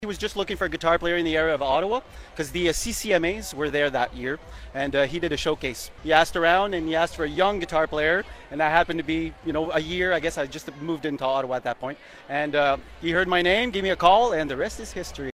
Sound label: guitar